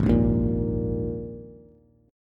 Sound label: Ab Suspended 2nd chord, Bass; strummed; JGuitar